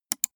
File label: gaming mouse left click single fast